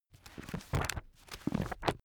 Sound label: book browse